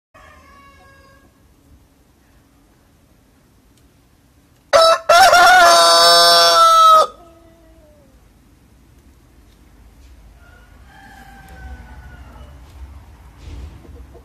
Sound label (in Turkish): horoz sesi